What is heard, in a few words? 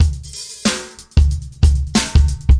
big wet funk drum, drum loops and breaks, 098 bpm; 091 to 100 bpm